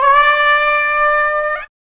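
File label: sax tone; X-Static Goldmine 1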